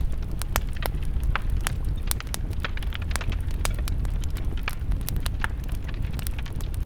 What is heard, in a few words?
warm bonfire loop